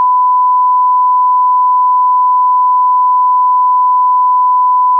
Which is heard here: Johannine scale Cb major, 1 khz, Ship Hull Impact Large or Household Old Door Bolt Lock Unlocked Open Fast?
1 khz